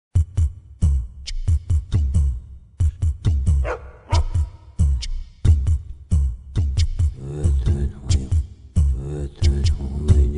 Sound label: Happy Village; sid meier s civilization revolution 2 2015 vita